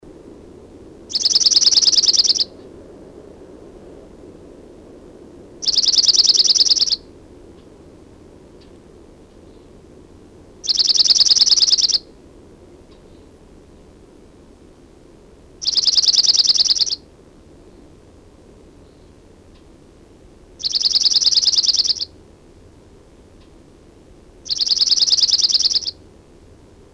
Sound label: Chipping Sparrow; Sparrows